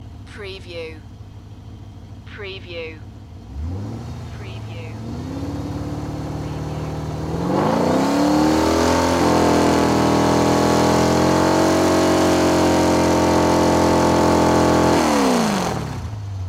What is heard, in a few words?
Chevy V8 Hot Rod Engine Idle Then Accelerate Then Idle